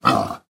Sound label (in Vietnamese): tieng lon rung keu to